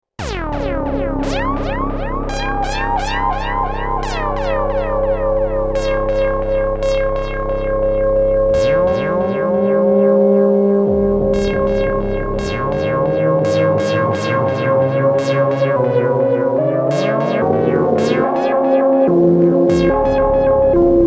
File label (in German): Experimental mit moduliertem Echo